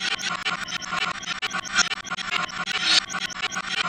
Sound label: Metal Weird Loop; horror and atmosphere fx; Silent Hill Downpour